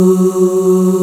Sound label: VOX F#2, Vox Percplex'd